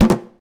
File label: Hit Kick Plastic Cooler; SFX Kit; Foley - Misc